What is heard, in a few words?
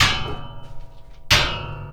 Hitting, Workshops